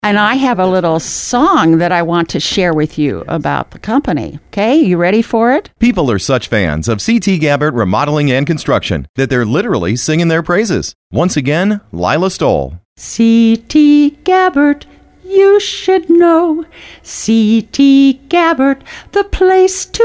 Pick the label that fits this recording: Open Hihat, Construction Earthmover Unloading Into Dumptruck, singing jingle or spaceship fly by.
singing jingle